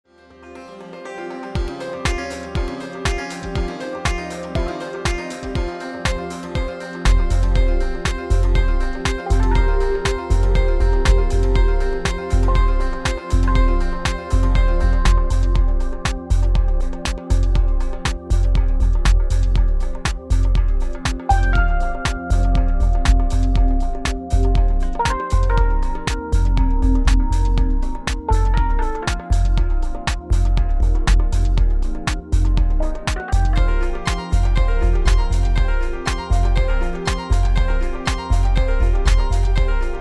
contemporary urban pop freakin funk

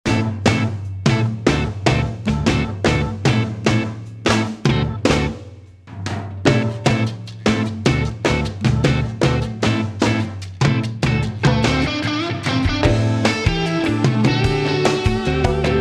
hard rock

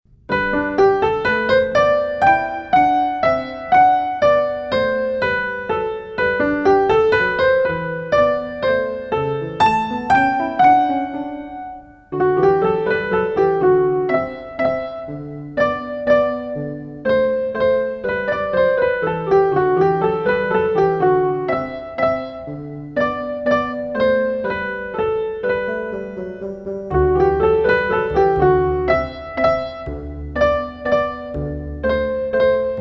classical

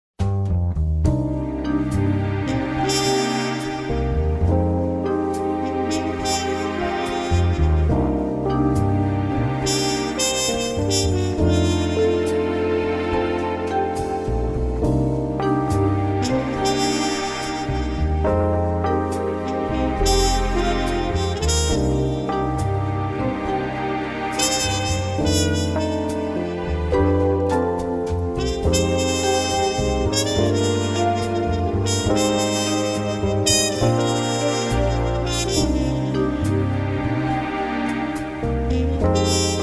Light Jazz

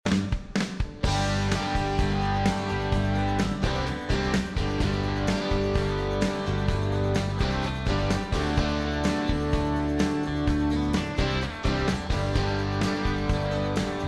country

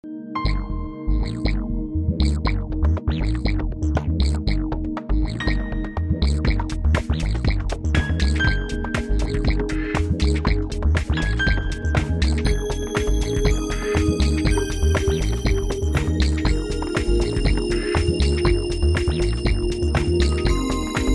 contemporary urban pop jiggle

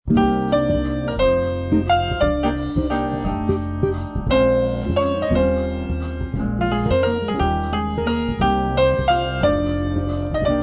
light jazz

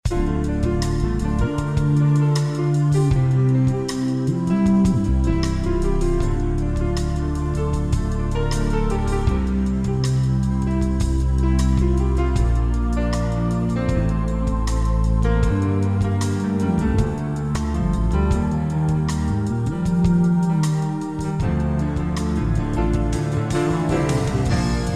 Light Jazz

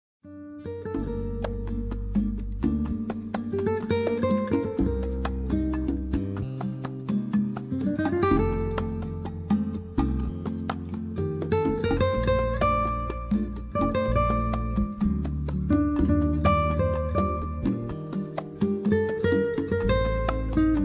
Specialty Latin

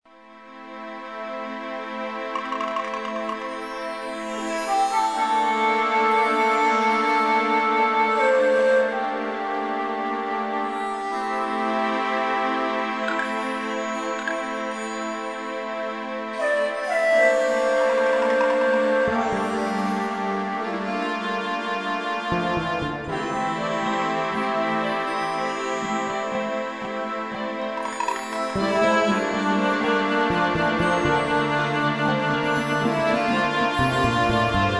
atmosphere

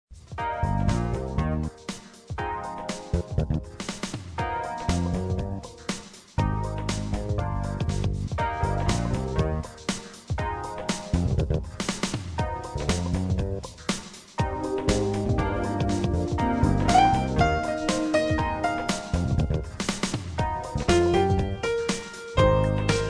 light jazz

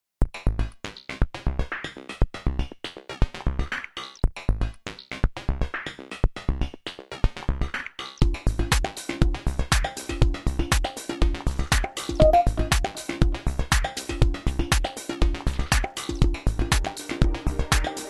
contemporary urban pop

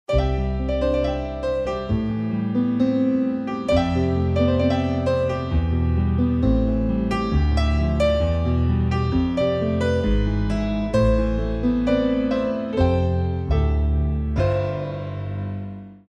Light Jazz

